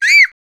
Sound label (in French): cri d'enfant